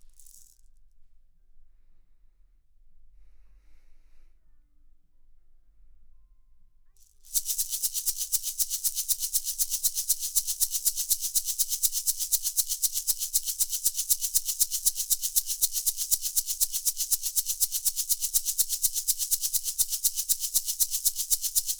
Shaker

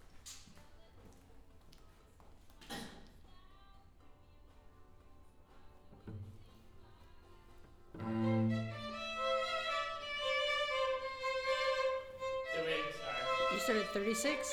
Cello

